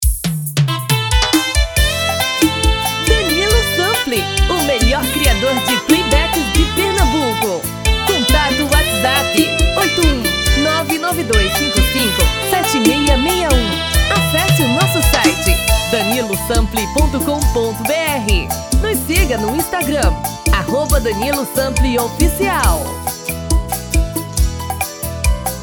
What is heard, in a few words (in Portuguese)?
Seresta